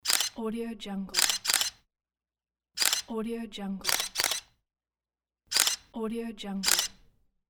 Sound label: camera; noemisvedar